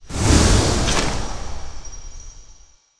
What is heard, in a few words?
draw; soulreaperex; cstrike